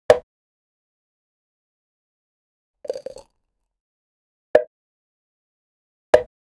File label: popping off the cap; ambience